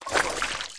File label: male water, footstep